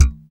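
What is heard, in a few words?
electric bass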